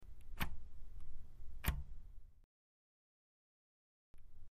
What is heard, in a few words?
Button Pressed Quick, switch clicks